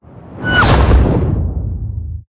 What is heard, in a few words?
whoosh; Audio Mangler